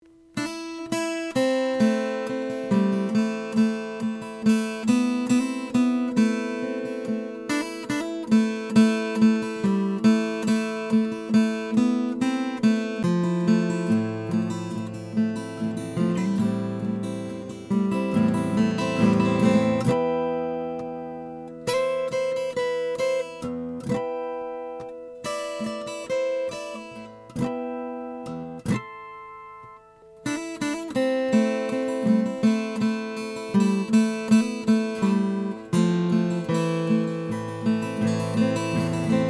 rainsong graphite guitar p12r red

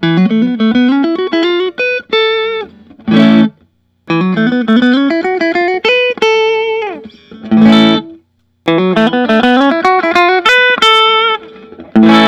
fender custom shop limited edition telecaste

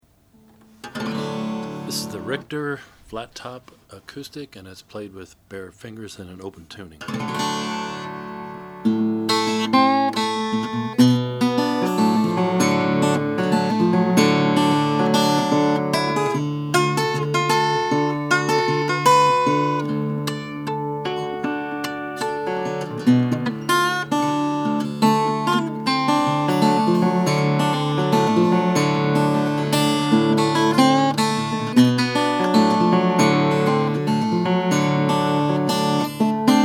1935 richter concert parlor red burst

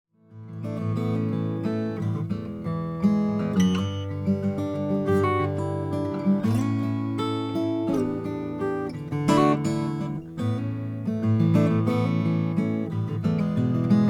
olson sj brazilian rosewood, guitar

